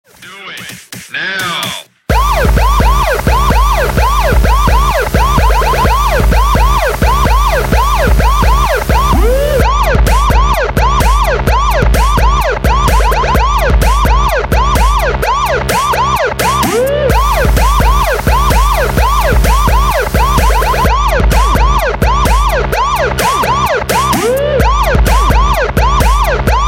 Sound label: freaky bass police party